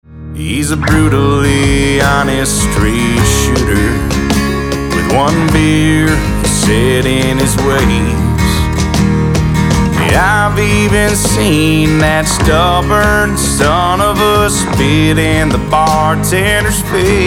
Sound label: beer tap folk